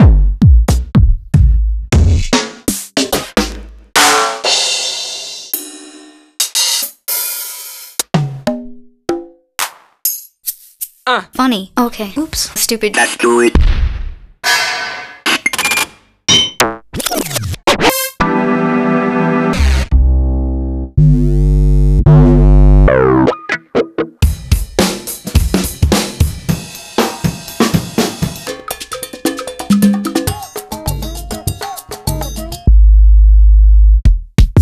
Korg ES-1 MKII

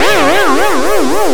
FX LOOP

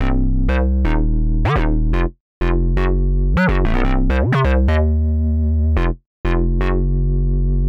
125 bass C; Basses; PowerFX - Nu Jazz House

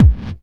BD, Dance Mega Drums, BD HOU; Best Service

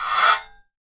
Guiro Long, Percussion; Roland BK-5

